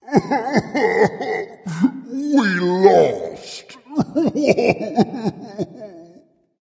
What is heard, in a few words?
boohoo dragon; fafnir